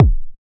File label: Electro Cycling Beat, Electronic Drum Samples, Kicks; E-Punk Project Drum Samples